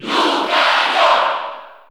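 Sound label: Cheer Italian, crowd; Lucario; SSB4; SSBU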